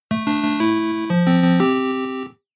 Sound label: Stage Clear Boss Defeated Jingle; Kamen Rider Black Taiketsu Shadow Moon; FDS